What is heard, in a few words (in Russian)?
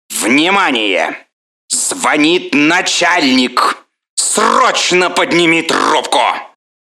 zvonok na nachalnika